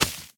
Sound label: unleash, leashknot